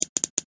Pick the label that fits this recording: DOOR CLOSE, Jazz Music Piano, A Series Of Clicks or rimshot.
A Series Of Clicks